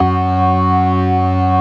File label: STOPS, Synth and Organ